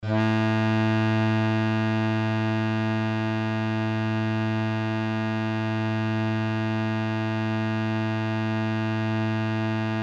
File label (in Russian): 3 struna, struni gitari